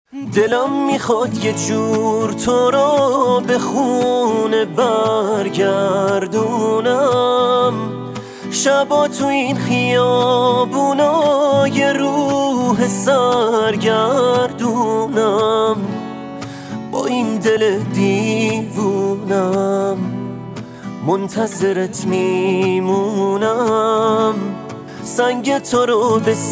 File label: Guitar Version